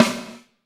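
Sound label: snare drum, Drums; Bob Clearmountain Drums I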